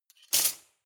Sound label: cutlery large multiple drawer place